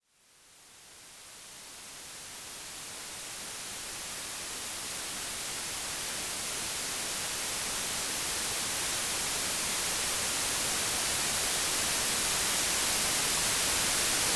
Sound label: White Noise, Meditation; RobinHood's AS Sessions